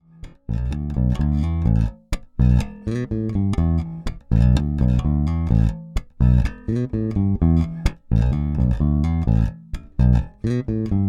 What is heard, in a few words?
Fender Precision Bass Neck Pickup passiv; Troy Sanders